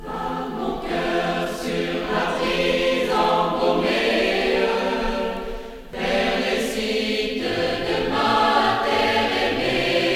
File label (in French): Choeur des esclaves, Chorale; Chorale de Gaubert Orléans